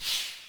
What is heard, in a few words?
MOVE; C64 Dreams; Multi-Sound Default